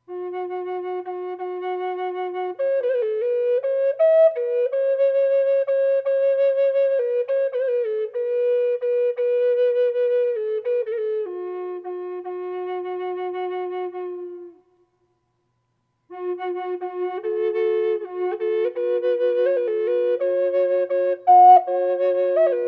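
F-sharp drone reverb, Heartsong Concert Drone and Double Flutes; Drone Flute in F# minor with light reverb; Sedona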